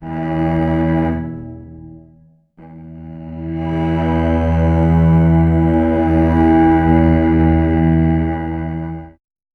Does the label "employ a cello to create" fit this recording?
Yes